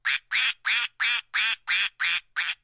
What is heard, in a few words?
duck quacks; Zoo and Nature